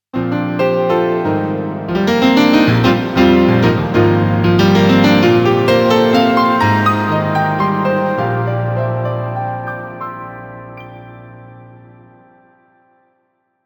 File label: Piano Shimmer